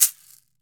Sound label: CAXY, CAXIXI; AKAI S-Series CD-ROM Sound Library VOL-5